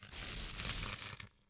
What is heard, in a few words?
wire; Through Abandoned 3: The Refuge